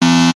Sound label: multimedia game show buzzer